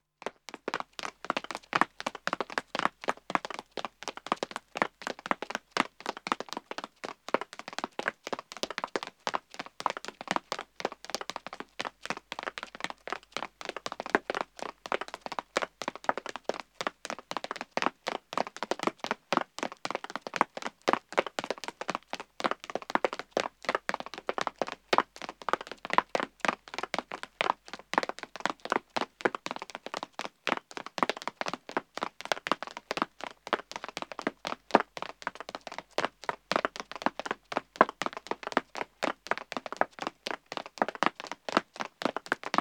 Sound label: Pavement Running 2 men start, Footsteps; The BBC Sound Effects Library